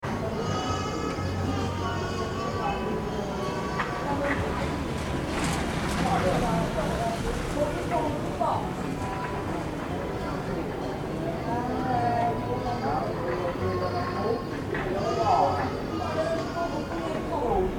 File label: ambient sounds hanoi; Vietnam; I recorded a lot of sounds in Vietnam